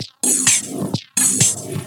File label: VFX2 Loop 128 BPM